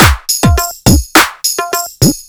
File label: Dholki Beat